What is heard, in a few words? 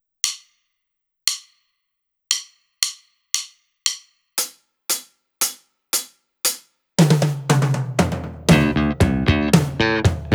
backing